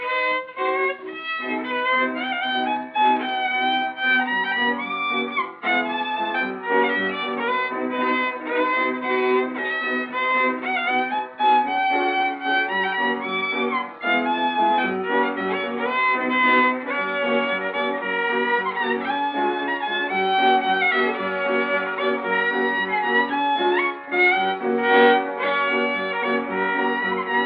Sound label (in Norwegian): MUSIKK; amerikanorsk; einar haugen